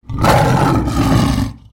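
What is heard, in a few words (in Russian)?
рык льва короткий звук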